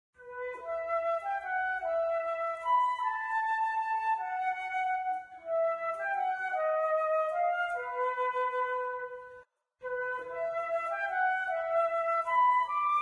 Flute; Zomboid; Lifestyle

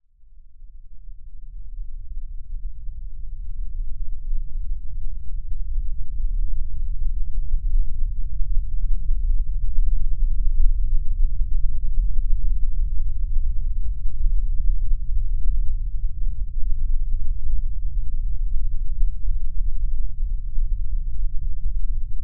Rumble, Storm; Zomboid; The Calm Before The Storm

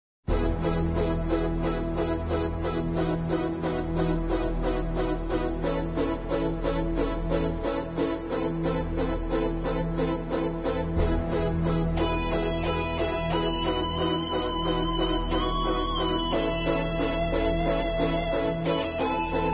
Keytar; Zomboid; Lifestyle

